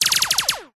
science fiction weapon gun silenced multiple shots fast automatic